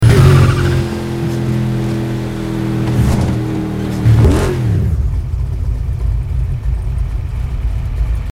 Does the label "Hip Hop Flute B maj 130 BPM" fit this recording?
No